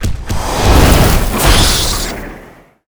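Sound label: boss attack, monster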